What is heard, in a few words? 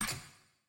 ui button generic click; tft; dragon